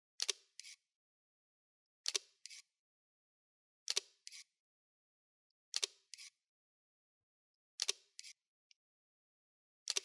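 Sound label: GFX 50r, camera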